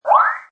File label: Metal Saw bend; Metallic Sound Sound Effects